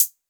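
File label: 808 closed hi hat; 808 SOFT; Wizoo - Platinum 24 Electronic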